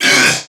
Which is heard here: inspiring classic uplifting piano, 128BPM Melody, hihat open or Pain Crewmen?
Pain Crewmen